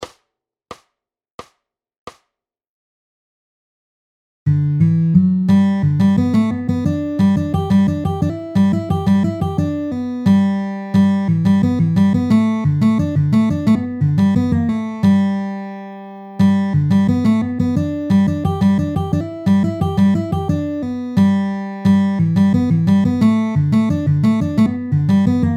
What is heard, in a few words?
rolls lick